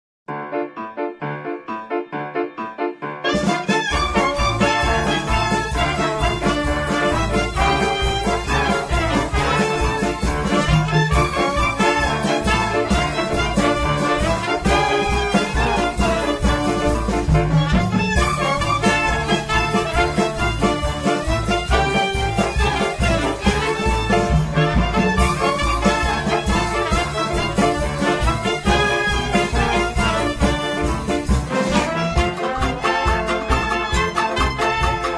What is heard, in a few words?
klezmer band; temple sinai; wu nemt men a bisel baash